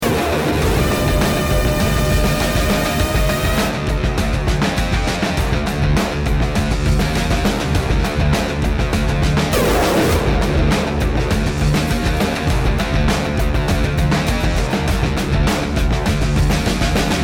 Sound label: start action, music; halflife alyx